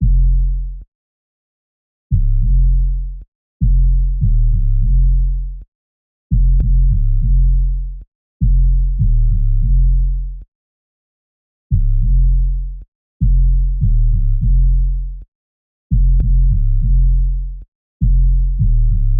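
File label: Hitters Bass 100bpm F minor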